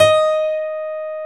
PIANO D#5; E-MU Formula 4000 Series Vol. 2

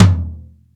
R-8 rude tom, Dry

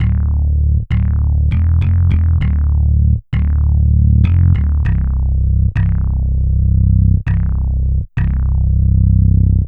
Synth Bass; Bass X

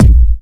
KICK -R, Disco House; USB Soundscan vol.20 - Fresh Disco House I

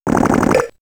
Elec FX Computer Game; X-Static Goldmine

